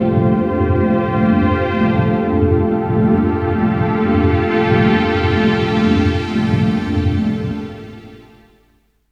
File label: Pad; Pads